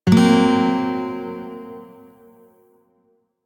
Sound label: Shawzin Blues Chord J; Music; DE; Warframe